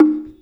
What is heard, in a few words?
GATO, Percussion; InVision Interactive